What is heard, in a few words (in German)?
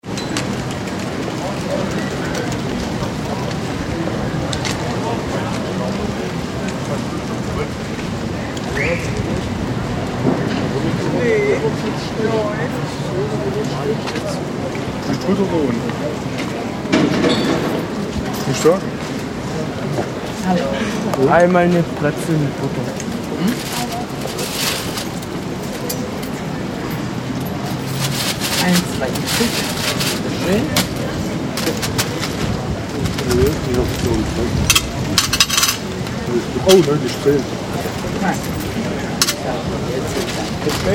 klang; brezelkoerble